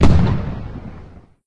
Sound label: Bomb